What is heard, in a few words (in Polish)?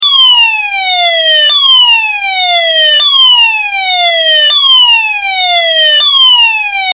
ton